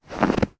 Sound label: snow hit; everness